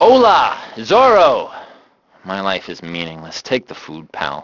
band; Grand Theft Auto Vice City; XBOX

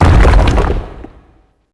Monolith hits platform; asgard

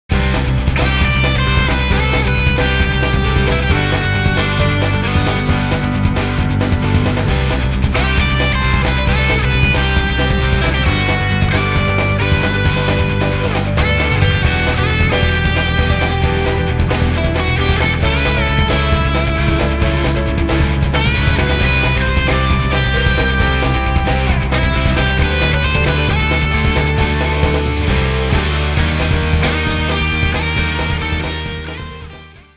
Guitar; OutRun 2006 Coast 2 Coast

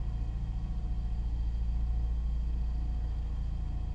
hallway ambience; Star Wars Obi Wan; XBOX

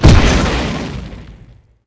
laser Hit; impacts explos

